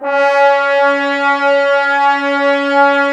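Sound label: brass trombones C#, Woodwind Brass Ensemble; 90 s Sample CDs; Miroslav Vitous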